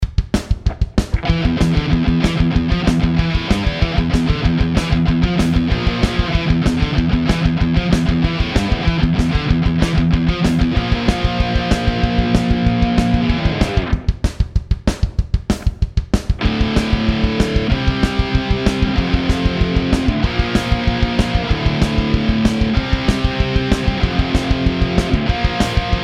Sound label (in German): Rectifier Bias